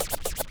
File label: Cuts, Vinyl Record Samples